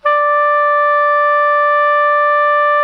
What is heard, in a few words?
SOP MF D 4, Saxophones; Greytsounds; Philip Wolfe David Crozier's Saxophones